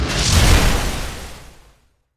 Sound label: sword swing special; weapons; dota; juggernaut